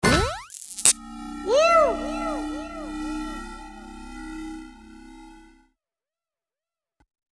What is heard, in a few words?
boost magnet